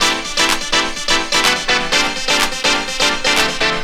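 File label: SATURN LOOP, Techno Trance; E-MU Formula 4000 Series Vol. 2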